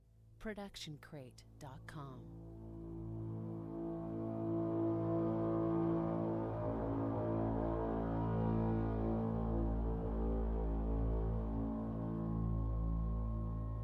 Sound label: Hollow Walls; SoundsCrate